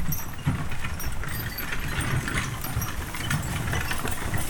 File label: Horse Drawn Wagon; Electa Quinney